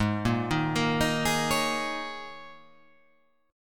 G sharp Suspended 2nd Suspended 4th G sharp, guitar; x,11,8,8,10,10,10,10